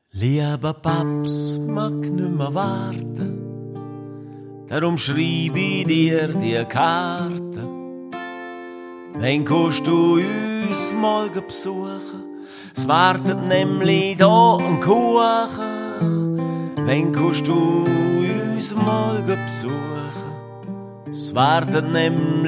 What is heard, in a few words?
music